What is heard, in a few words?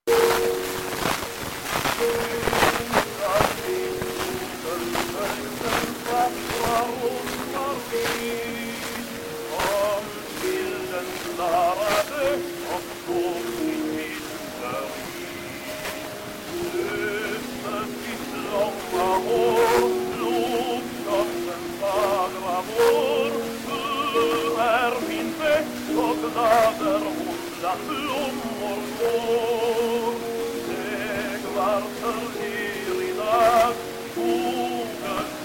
Gramophone